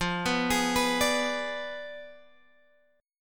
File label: F Diminished 7th F down Guitar Open F; arpeggiated